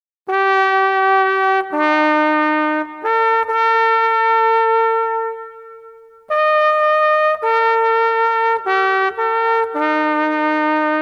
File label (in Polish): hejnal torunia